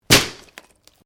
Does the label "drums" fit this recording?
No